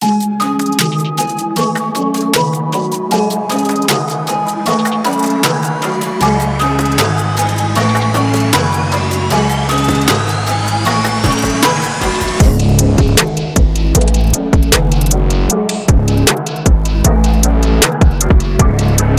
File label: dark trap beat